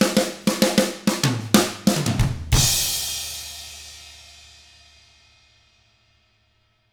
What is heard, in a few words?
HARD ROCK, Drums; ILIO; Double Platinum Drums 1